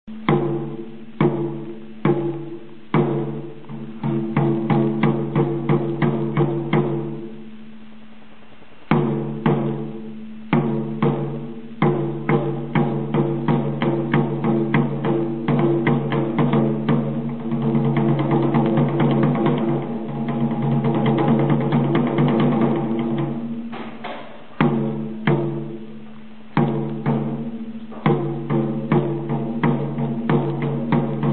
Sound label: taiko